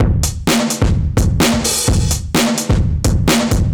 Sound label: Old Drums 128; musicradar